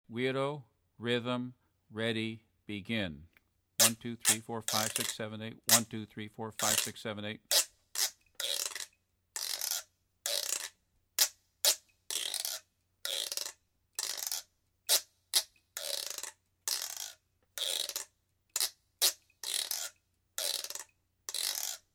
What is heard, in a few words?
Rumba guancaro